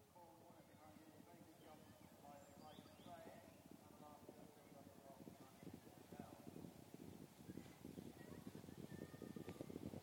Point to Point Racing, field passes over jump, with one straggler; Horse Sound Effects; BBC